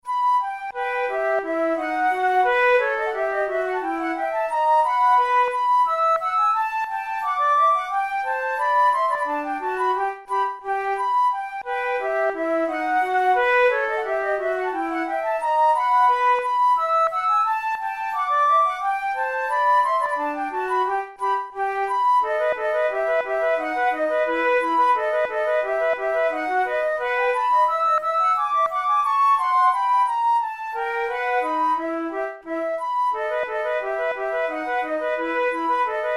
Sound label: fantasia per flauto solo in g major andante mosso; mercadante